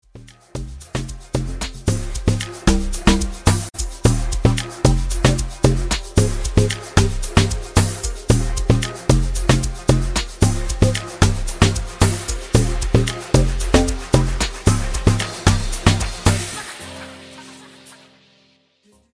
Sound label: Funk